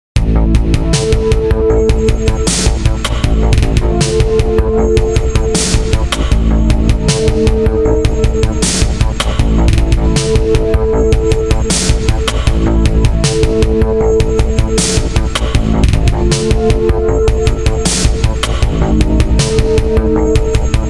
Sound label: musical sting; Haunty McVampirechick